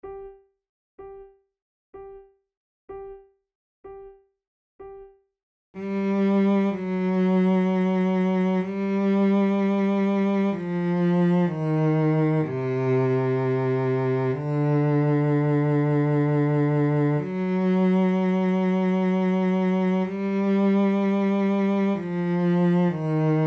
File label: recorder sonata in G minor adagio; Marcello